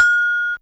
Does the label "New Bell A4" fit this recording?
Yes